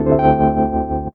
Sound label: E.PIANO, KEYS